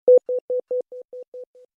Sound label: Soft Ring